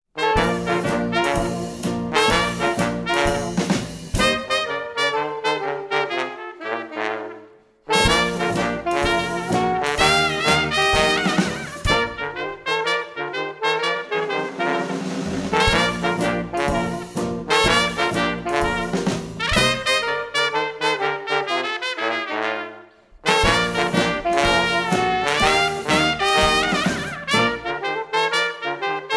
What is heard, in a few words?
Oldtime Jazzband